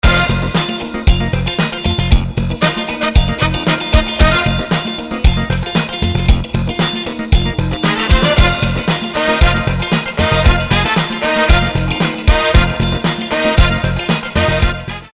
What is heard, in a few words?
rock